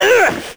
hurt; Crypt of the NecroDancer

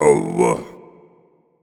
blues king attack; Crypt of the NecroDancer

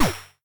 player hit; Crypt of the NecroDancer

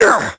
vo hurt; Crypt of the NecroDancer

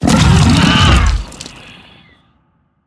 worm death, creatures; Factorio

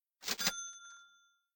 pickup purchase; Crypt of the NecroDancer

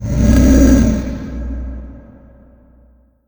coralriff hit; Crypt of the NecroDancer

